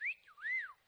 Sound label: whistle sexy